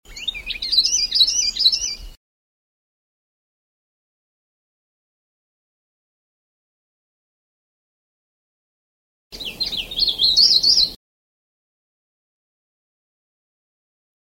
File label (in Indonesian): kicauan cikrak sulawesi